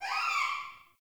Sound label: Cave Ocean Creature Close; Midrath Island